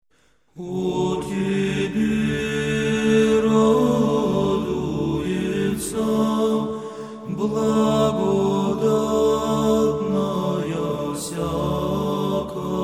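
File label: O Tebe raduetsya (Greek chant); Se ZHenih gryadet v poluno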